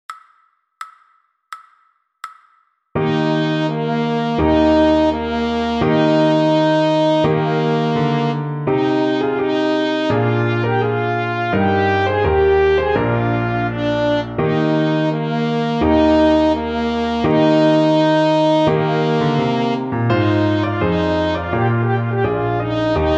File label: trumpet slow; Trumpet Tune